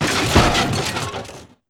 chest breaking; Politburo

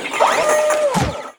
turret; Politburo

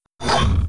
menu down; Politburo

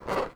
squeak; Politburo

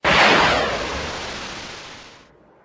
knight landed; Politburo

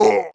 grunt; golden knight; Politburo

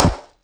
balloon explosion; Politburo

